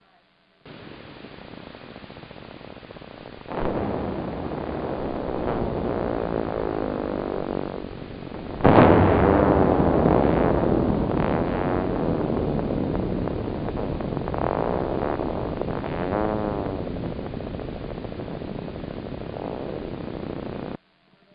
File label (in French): MYOTONIE